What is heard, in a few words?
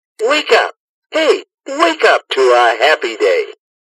wakeup